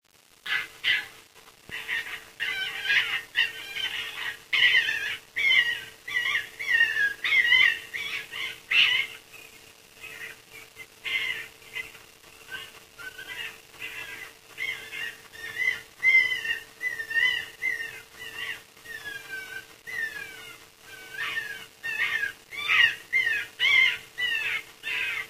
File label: fox cry